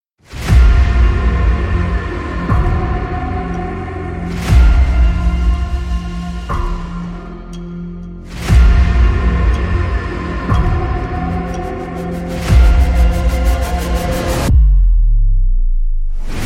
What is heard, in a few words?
Epic Music instrumental